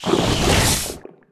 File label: Bio Rifle Wave; Requests Studio; UT3